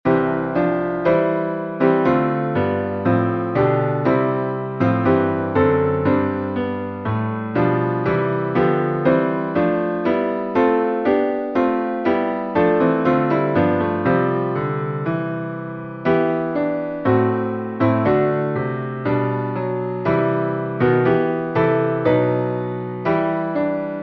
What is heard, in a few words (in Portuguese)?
salmo playback